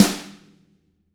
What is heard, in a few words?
HIT, Drums